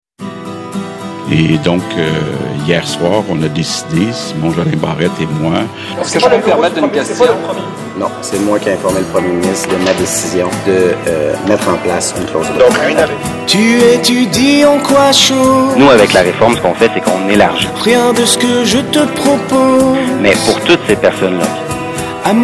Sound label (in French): foule; ministre sentimental; simon jolin barrette